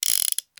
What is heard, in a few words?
Gun, Hand, Spin Cylinder; gun and weapon sounds; captain audio; fully loaded